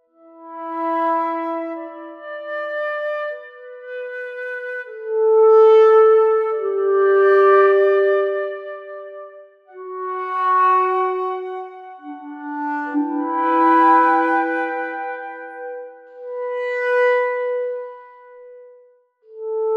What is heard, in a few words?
Camp Wind